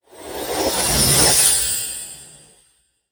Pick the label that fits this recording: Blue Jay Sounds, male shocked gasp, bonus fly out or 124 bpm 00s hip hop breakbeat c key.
bonus fly out